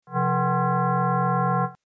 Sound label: interpretive tonal mod